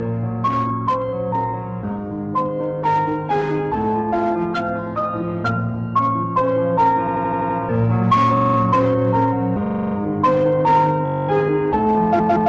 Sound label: samsung ringtone